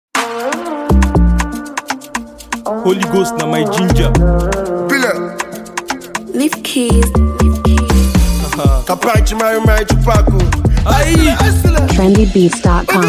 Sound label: Instrument